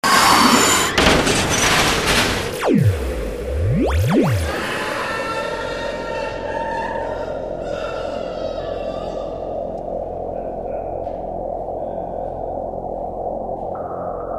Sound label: Animation SFX